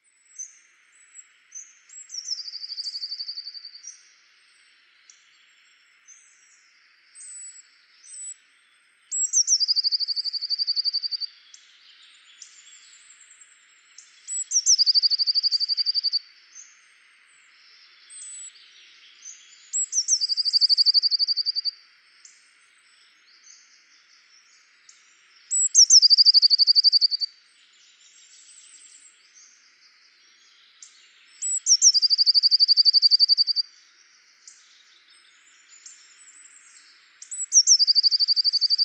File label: Cyanistes caeruleus; SETE